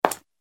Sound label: footsteps